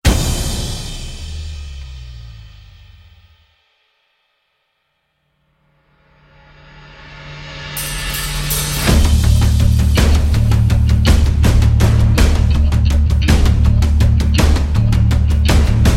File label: drums and bass